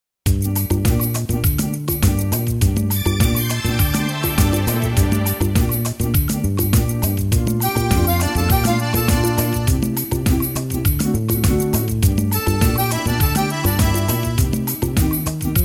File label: minus gm alto